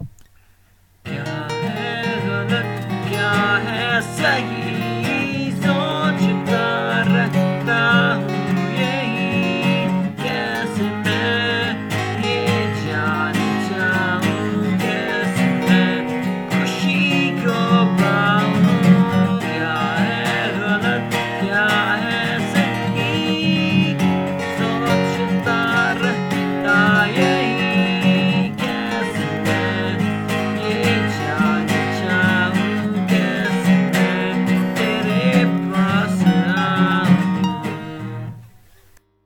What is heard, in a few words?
awesome riff with vocals